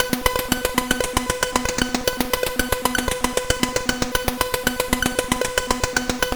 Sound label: Synth Sample III, CSG8580R5